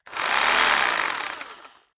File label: rock drill hammer rev long; maschinen; bohrer; soundpool